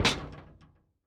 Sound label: Footstep Metal; Opsive; UltimateCharacterController